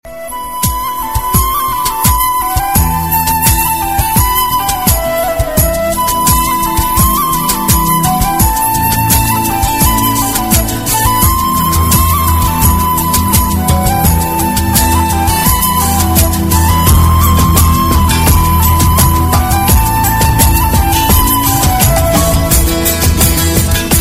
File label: romantic arabic ringtone